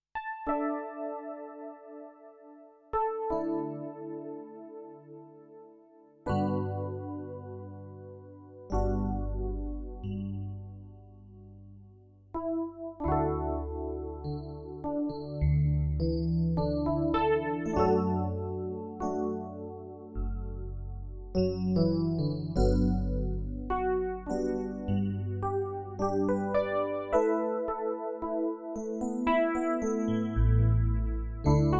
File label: NU1 EPiano